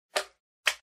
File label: slime block